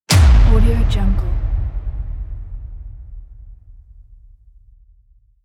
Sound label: cinematic drum hit